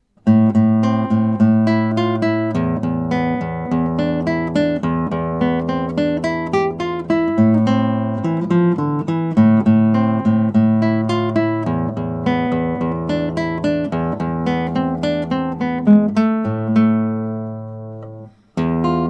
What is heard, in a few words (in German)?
Gitarre; Komponist von Gitarrenmusik